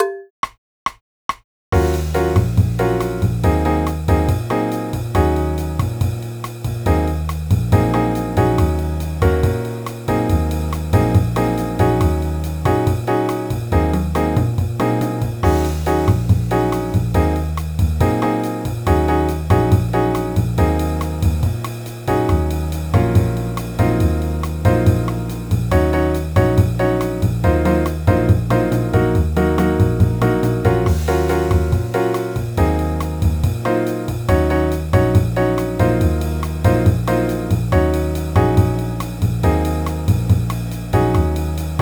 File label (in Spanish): C Dórico Eb Instruments; clases de jazz